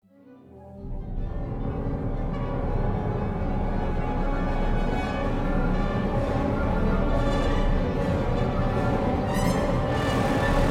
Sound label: Approaching Tsunami (Choir)